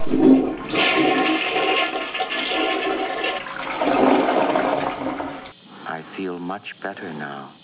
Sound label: flush much better